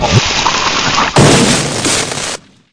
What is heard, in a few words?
death; cof